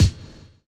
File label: BD; Best Service; Dance Mega Drums